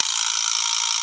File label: Yamaha EX5, Drums